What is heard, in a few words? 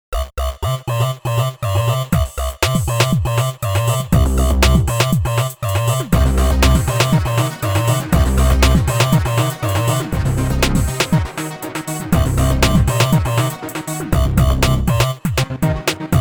Electronic Vocoder, EDM 리듬